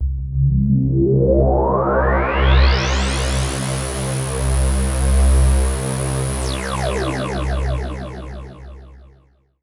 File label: Delay Sweep C; Trance Formation